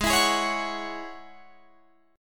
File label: Ab 7th Suspended 4th Sharp 5th Ab down Guitar Drop D, Chord; Ab7sus4#5 strummed